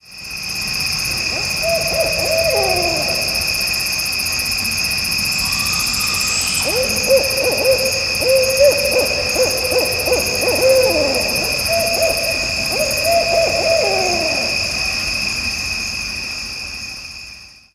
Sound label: Owl Call